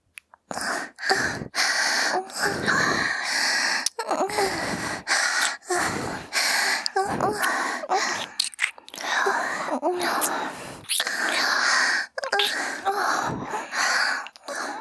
喘ぎ声 左右; 妖艶の湯 金髪エルフの少女の手籠めにされちゃう…～Sに目覚めた新人さんのいいなり♪～

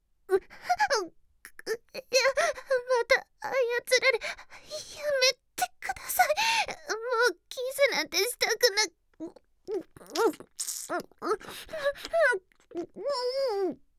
操られキス; 前口上

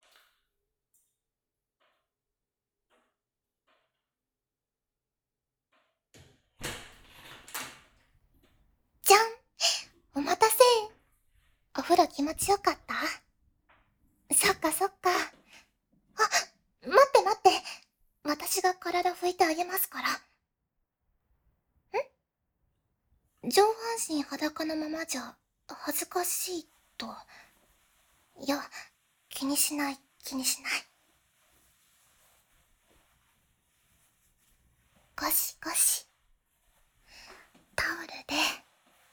お風呂上がりのタオルドライ

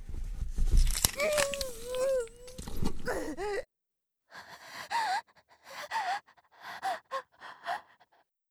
太ももを刺す

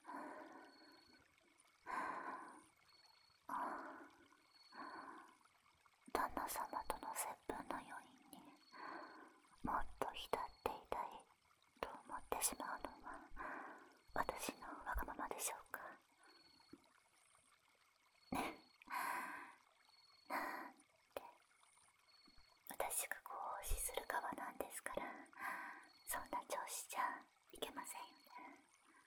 じゅるじゅる耳舐め, バイノーラル; 『愛の舐め癒し旅館』ぬらり舐め癒しバイノーラル音声 耳かき 耳舐め ハイレゾ

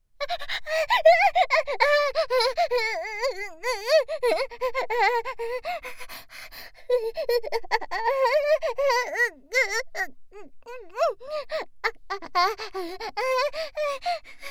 絶頂前の喘ぎ

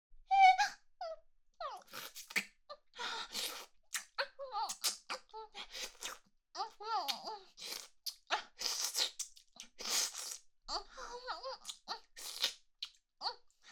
イラマチオ・抵抗しながらも恐怖に怯えながら男性器をぺろぺろと舐めている声; 明るい少女系カノジョ

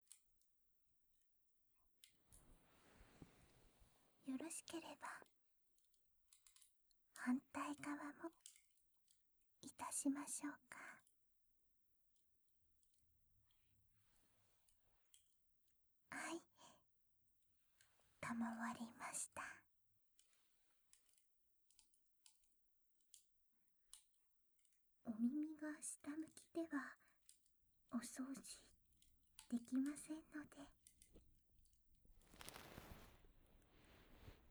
耳かき右; 【アイヌ系】道草屋 すずな 囲炉裏の音【うたた寝音声】; 初日 囲炉裏

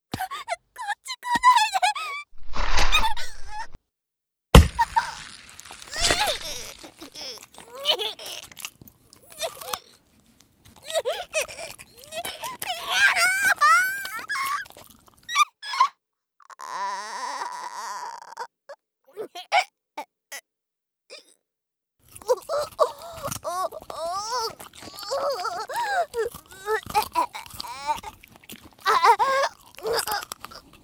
蟲に襲われる

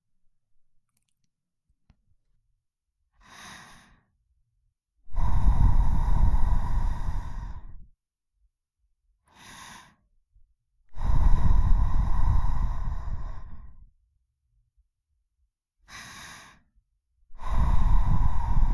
耳ふー 左

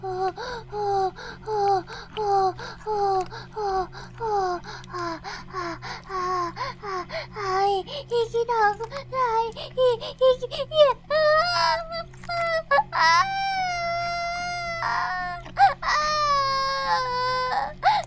バイブ放置

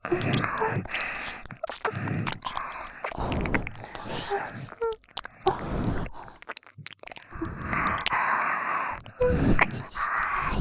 耳舐め 喘ぎ; エッチしよ

